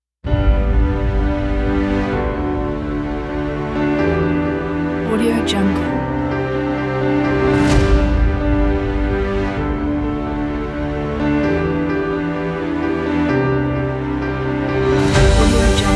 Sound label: inspiring emotional trailer